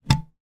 household mains plug pull from wall 2 pin australian